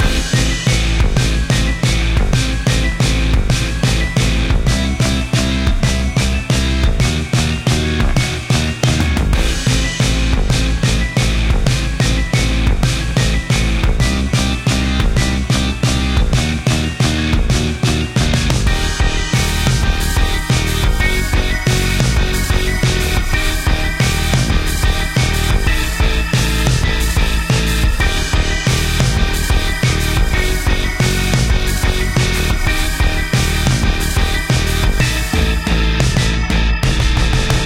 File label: Big Boss Battle Loop; Quest Rookie High; club penguin island; android